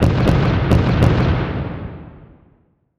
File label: Explosion Howitzer Cannon Fire Four Shots; Fire and Explosions